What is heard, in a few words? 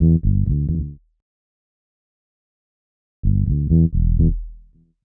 Bass Loop 130; Equipped Music; Loungin House